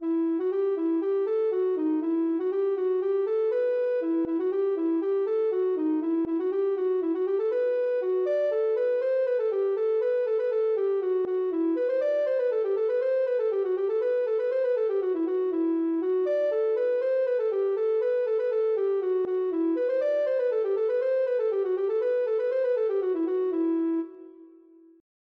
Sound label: ocarina